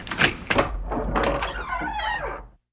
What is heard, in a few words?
Footsteps Door Opens; Inquisitor 3